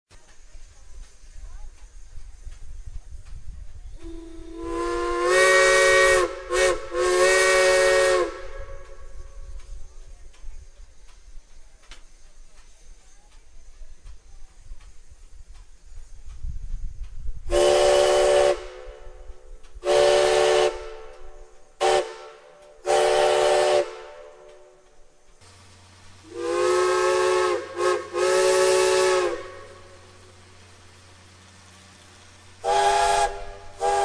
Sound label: whistle blow, steam whistles